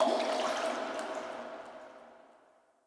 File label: Coin Toss Land; Level Events; Midrath